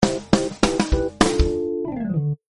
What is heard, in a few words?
Jingle Game Over